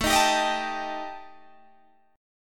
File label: Eb Major Flat 5th A